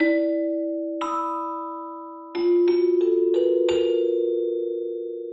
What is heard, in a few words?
Ferric Mallets, 90bpm, E; Loops n Lines